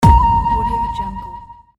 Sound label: ancient egypt hit